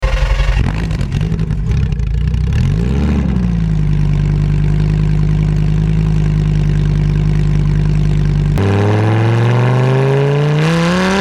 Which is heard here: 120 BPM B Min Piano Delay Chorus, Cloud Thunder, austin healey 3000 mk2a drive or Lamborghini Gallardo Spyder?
Lamborghini Gallardo Spyder